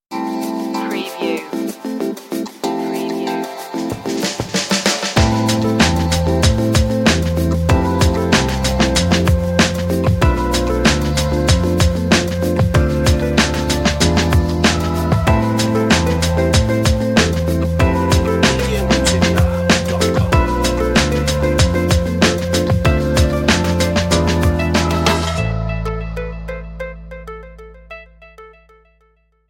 seaside lounge

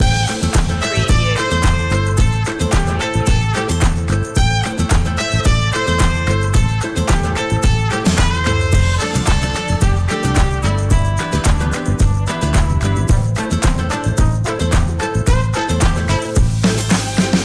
latin stomp loop

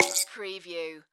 Multimedia Percussion

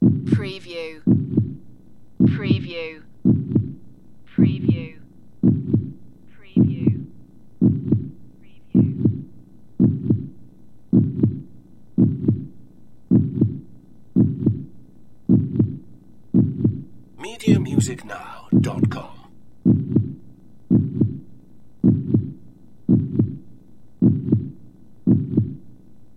Heartbeat

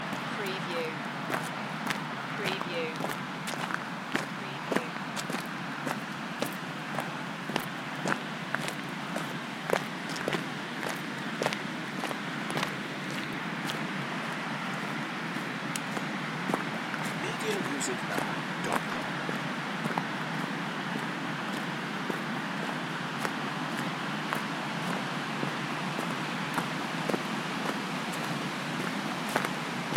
Riverside footsteps; beanstalk audio

